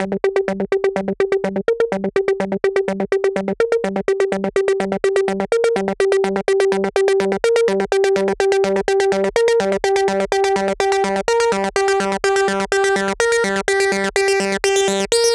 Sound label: techno